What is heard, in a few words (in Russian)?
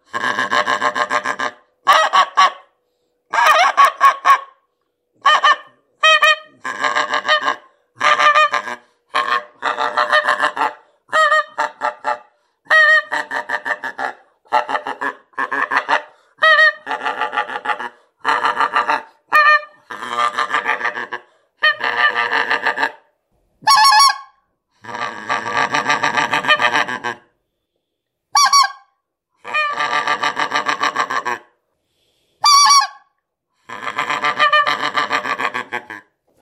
гуменник